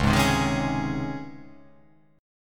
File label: C sharp Major 7th Suspended 2nd, C sharp